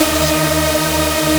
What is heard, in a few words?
Synths D#; Vengeance Samples